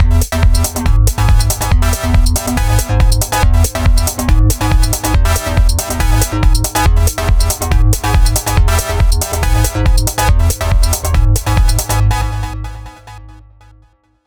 pulsating fun techno house loop 140bpm

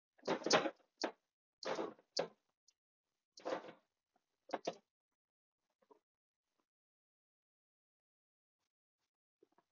Kick

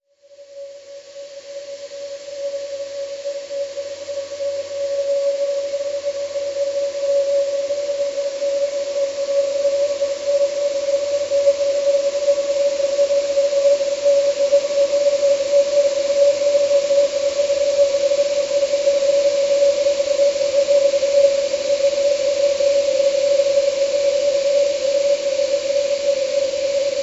scary woods at night; mixkit

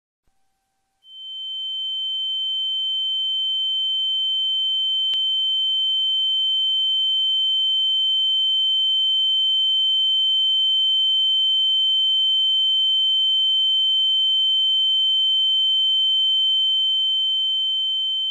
Ear Ring

